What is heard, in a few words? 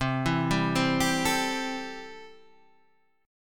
C 6th C, chord